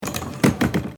S Bahn Fensterklappe

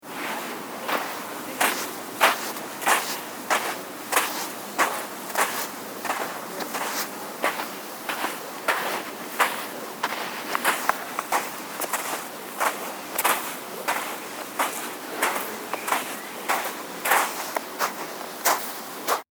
Schritte auf Sand

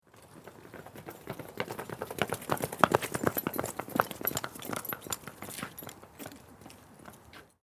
laufen im rudel

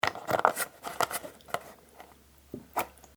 Versapack Drehverschluss

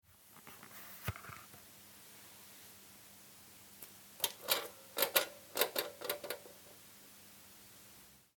Personenwaage

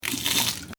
bad mund ausspucken

